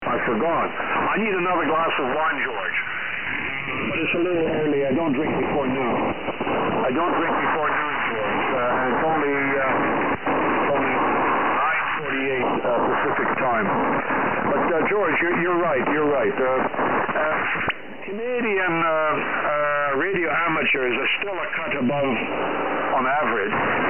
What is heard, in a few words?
awful signal